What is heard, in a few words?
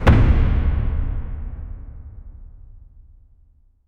a deep powerful hum lasts